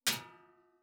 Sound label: Metal Hit; Fantasy Sounds Bundle; Medieval Combat Sounds